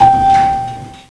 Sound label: elevator ding; tams quad